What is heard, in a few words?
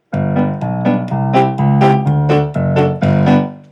G B C Db D Gb G bass and chords, simple piano recordings